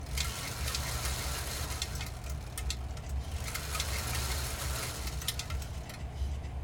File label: MECH Trim Spinning (Power-Assisted Steering), Aircraft Control Board, Boeing 737 Airplane Cockpit; Articulated Sounds